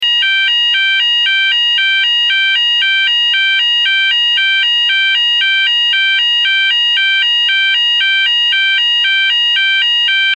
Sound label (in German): Ton